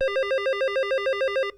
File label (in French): sonnerie fixe; Sonnerie de téléphone fixe 3